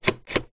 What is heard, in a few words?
musical midi keyboard controller fader movement; zapsplat